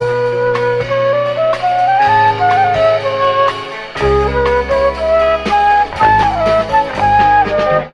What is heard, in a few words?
flute no tagback, Loops, Jazzmatazz